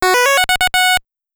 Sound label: Correct; Tim Follin; Pictionary (NES)